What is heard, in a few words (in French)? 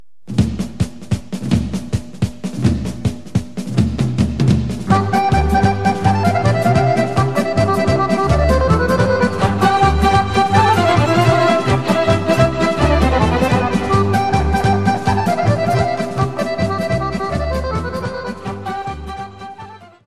Vranjanski Cocek, Serbie